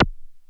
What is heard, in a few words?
Perc Hit, Noise